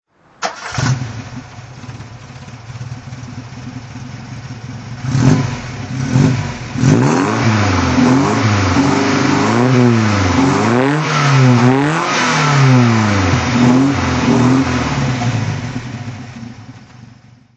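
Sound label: alfa romeo gt1600 junior throttle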